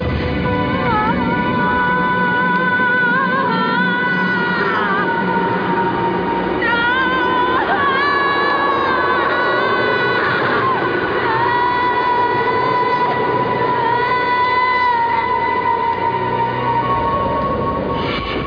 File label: snow song